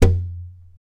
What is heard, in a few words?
TAP, FLAMENCO Guitars